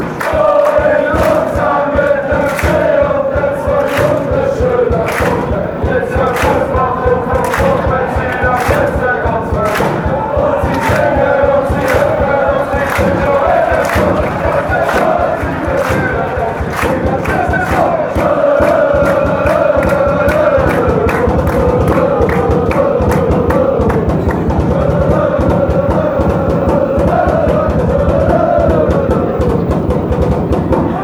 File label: quick march tralala, fanchants